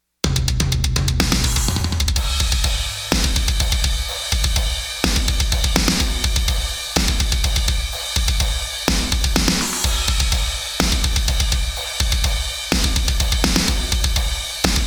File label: drums on